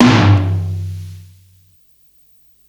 Floortom, Psychedelic Drums

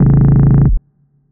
Odd Stab

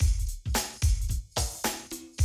Classic DrumLoops

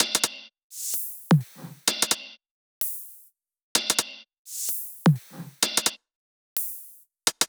Loops 128BPM, Drumloops

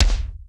MONDO K; Crypton - DrumBase5000; R70 KIT #2

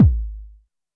kick, Zoom MRT-3B

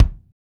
kick, Pop Country; Northstar; Drumscapes Roland

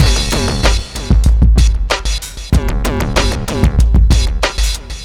95bpm

